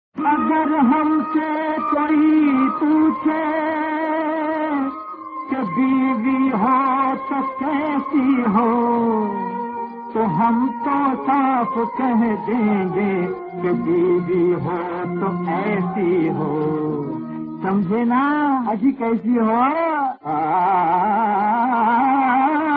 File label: chorus